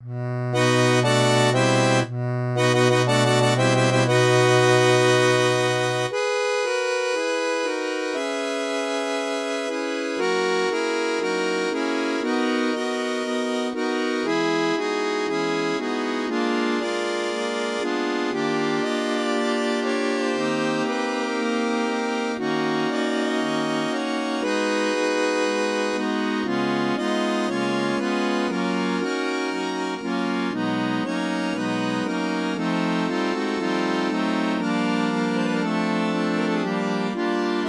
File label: Synthesized audio for study; harmonica